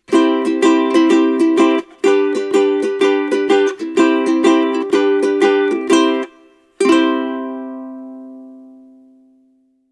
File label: concert ukes w pus ortega rudawn ce miked